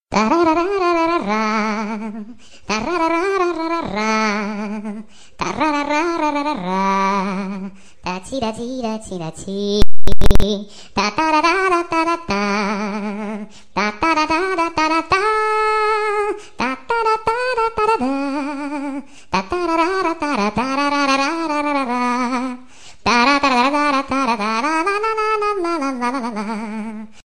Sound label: Mobile ringtone